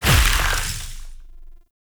Void Release Heavy; Weapons; Warframe; Duviri; Incarnon Adapters

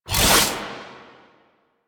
Whoosh, Warfan

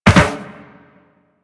Land Soft Metal Perforated, Footsteps; Warframe; Operator

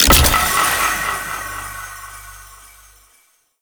Figurine Pickup; Duviri

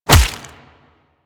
Melee Fist Impact Flesh; Warframe; Weapons; Fists

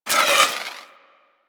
Wall Hand Slide; Footsteps; Warframe; Tenno; Wall Run

